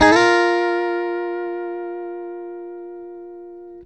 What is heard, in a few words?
STRAT GT; Synclavier Sampler Library; KEYS AND GUITARS